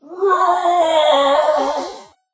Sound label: Future Groan